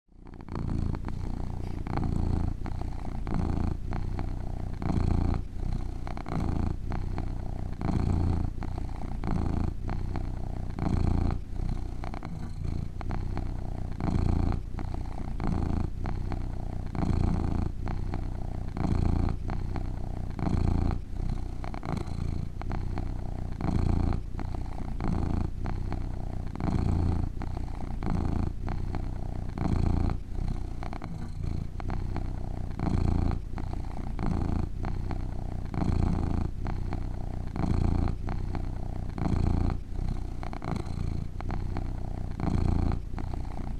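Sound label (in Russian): спокойное мурлыкание кошки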